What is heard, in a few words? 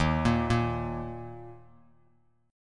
D sharp 5th D sharp, Bass Standard; arpeggiated; x,6,8,3; JGuitar